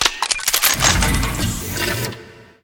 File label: security lock